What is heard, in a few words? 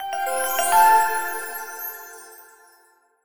Rewarding Chime